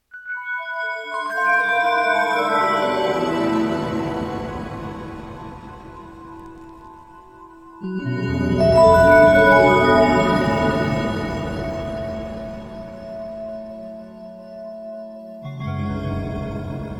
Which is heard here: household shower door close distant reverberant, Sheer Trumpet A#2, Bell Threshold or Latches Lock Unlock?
Bell Threshold